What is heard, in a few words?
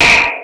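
snare sfx, Yamaha Motif ES-Series; 300 Drum Machines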